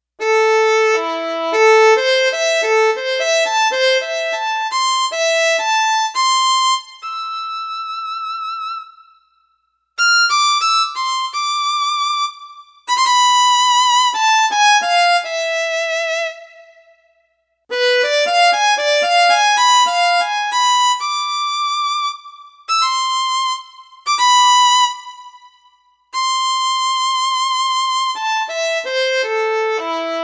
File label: Solo Violin